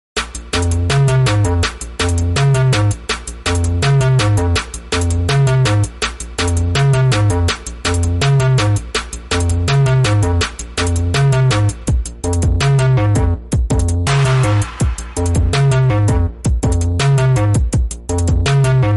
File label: music tone; black panther; marvel bgm